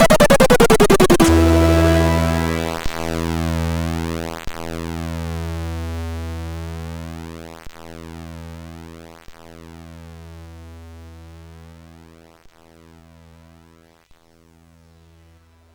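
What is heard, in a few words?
Rockit, MOS 6581 SID chip music, games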